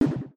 ui click, sci-fi

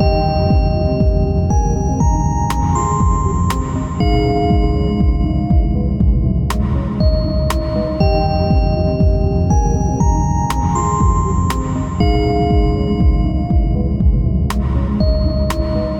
seamless tune muted

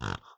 pig idle

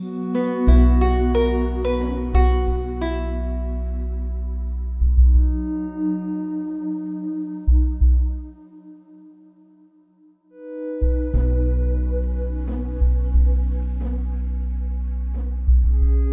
jungle chill

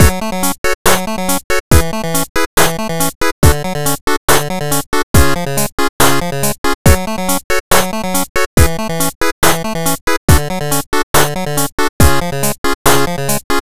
8-bit Loop

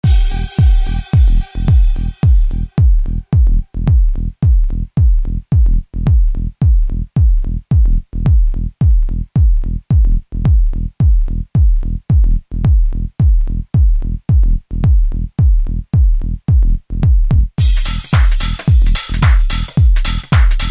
Another Bass

